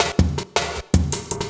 Loop, Drum & Bass, 160 BPM

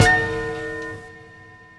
CHD, Jungle; E-Lab; XTC Files of Jungle

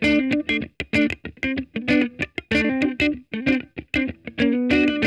ROLLER, Funk Guitar; Zero G - Funk Guitar

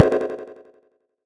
fx alien mystery; neuro; Sinistarr; Synths and FX

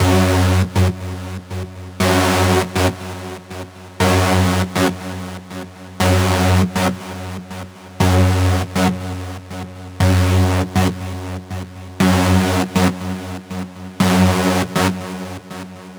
Techno Synth

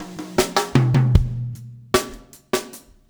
SLICK

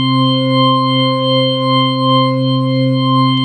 ORG ORGAN, ORG Mini Church; Sound & Vision; Gigapack I CD 2; ORG B-3 ORGANS

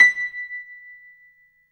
PIA MICRO, MICRO-PIANO, Roland; Sound & Vision - Gigapack II

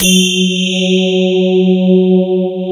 CMI PIANO; East West; Twisted Textures-BT

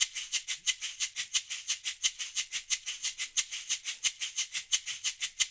LOOP, SHAKERS, Percussion

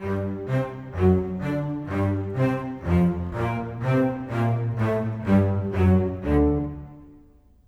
Bass Cello, Orchestral; Sonic Foundry (Sony Creative Software) - Orchestral VOL-2

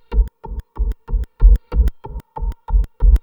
SQUARES IN, TRIPLE SWEEP; Spectrasonic Distorted Reality 1

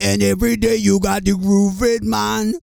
REGGAE, Vocal Planet World Voices

